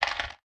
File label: low density inventory pickup; factorio 2.0.55